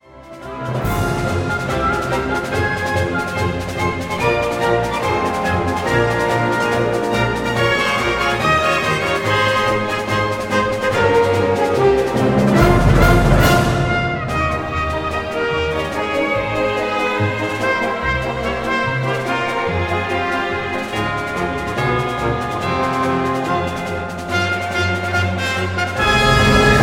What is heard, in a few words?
Orchestra Concert Sample; The Legendary Air Ride Machine